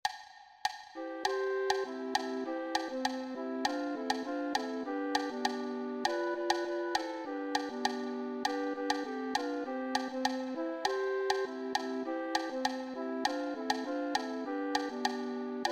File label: PERC; Portemos alegria